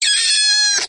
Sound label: silverfish kill; mob; Minecraft; Votive World